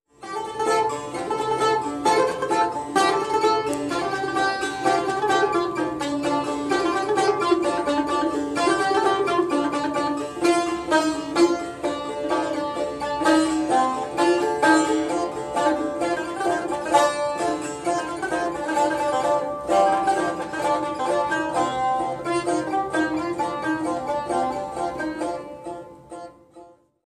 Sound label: tar instrument